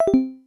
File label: close; smbx2; SMBX Level Player; SMBXLP